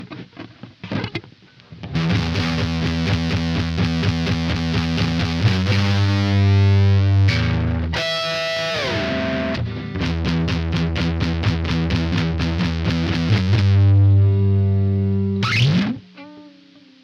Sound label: Git Drive; Steinberg UR24C